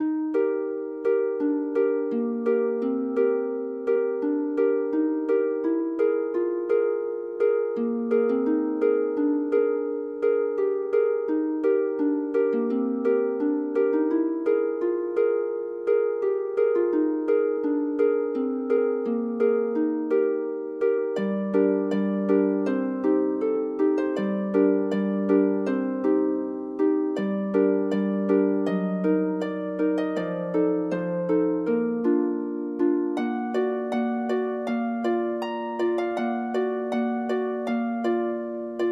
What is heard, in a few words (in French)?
harpe; hasselmans alphonse; crepuscule